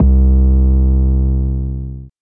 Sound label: Bass 808 C2